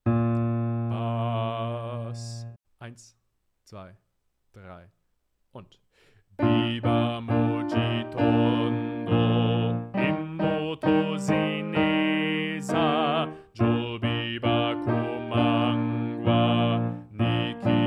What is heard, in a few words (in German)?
Bass mit Tutti Klavier